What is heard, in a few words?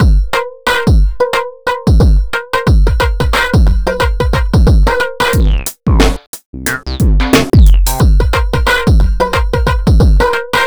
jazzeek 090bpm; Zero-G; Downbeat Dance Grooves